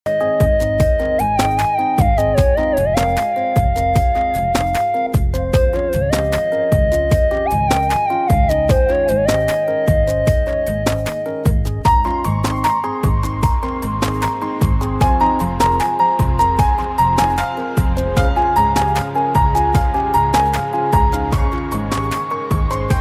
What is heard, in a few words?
Guitar Instrumental Ringtone